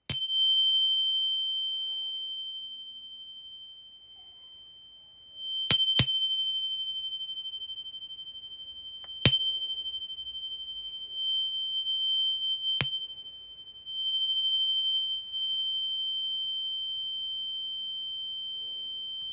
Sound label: Chime; zemlja